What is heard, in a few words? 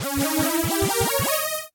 lasers; Warden ash